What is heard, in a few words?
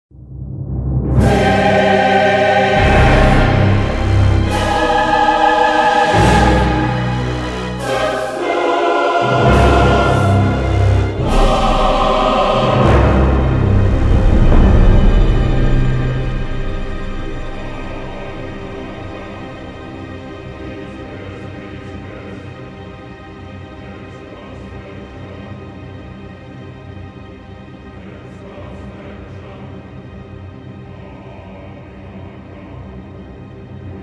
lose; valve dota 001; stingers